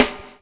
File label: BUBL, BUBBLE; Spectrasonics - Backbeat